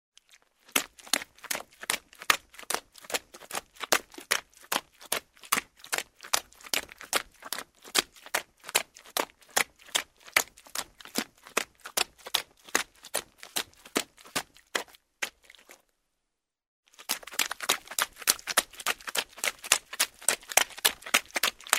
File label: Running in the mud; steps